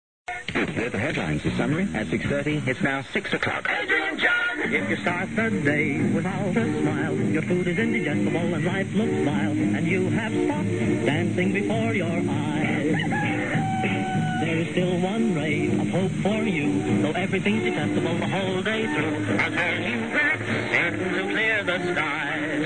jingle; adrian john

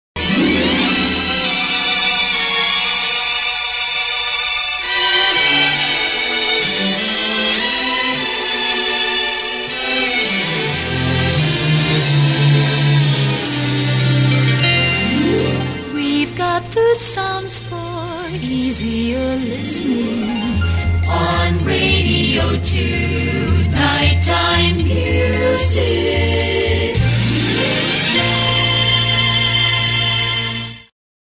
jingle

